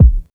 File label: warm steel kick drum b key